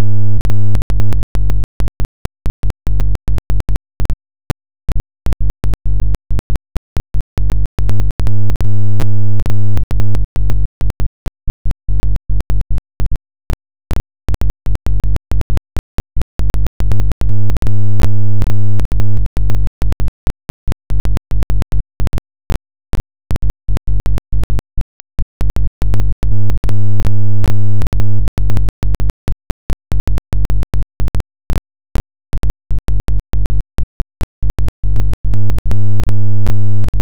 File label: apocalyptic noise; sixth seal